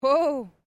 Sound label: FEMALE EXCLAIM; Exclamation; Voice